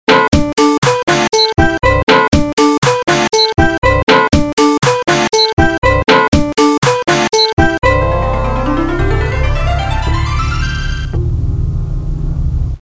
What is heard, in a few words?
Funky Funny Song